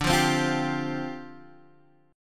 D Minor 11th D down Guitar Half Step Down

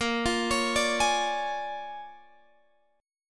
Bb 7th Sharp 9th Bb, Guitar Open G; Bb7#9 arpeggiated

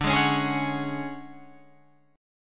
D Major 7th Flat 5th D down Guitar Drop D

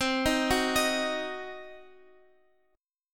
C Diminished C, Guitar Open F; arpeggiated; JGuitar

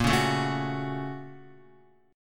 A sharp Minor Major 7th Sharp 5th, down, Guitar; A#mM7#5 strummed; JGuitar

